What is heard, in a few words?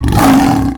lion